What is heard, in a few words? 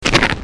cartoon; funny